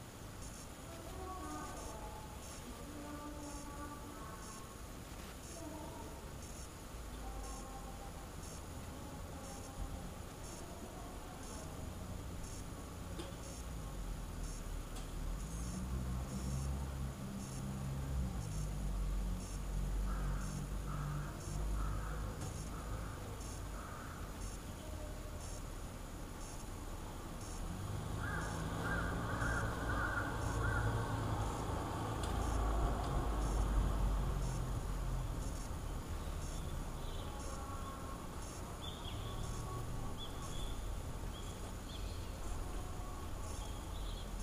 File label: bath washing window